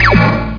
Lazer

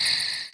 ambient hiss

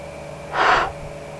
blow, People; Sound Library 2000; Wayzata Technology

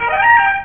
FANFARE; Internet 1996 World Exposition; Japan; Panasonic; funcity; tokyo; tower

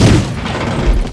ROLL; mtm2demo; PC Gamer 4.2

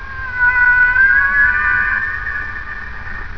Whale Cry; Animals+Sound

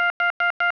Launch Tone; F⁄A-18 Hornet; F/A-18 Hornet 2.0 Demo

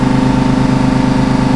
MOTOR, Slot Car

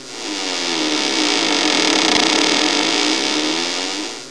swing

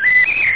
Birds

